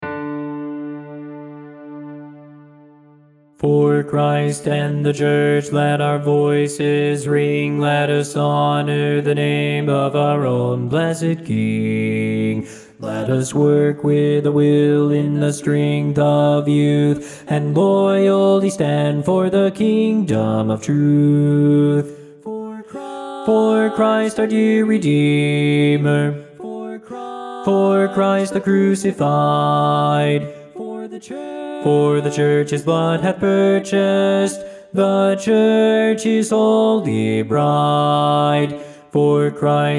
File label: BASS